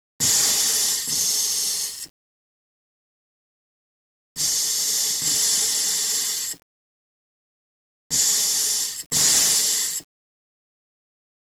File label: breathe